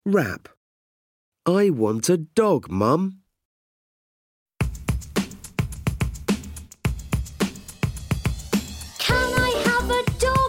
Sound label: Rap